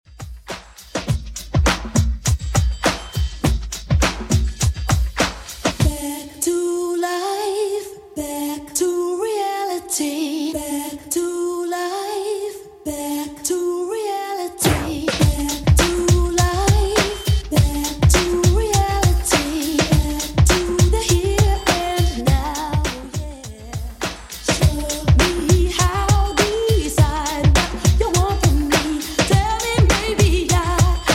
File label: 80s Redrum 102bpm; remixplanet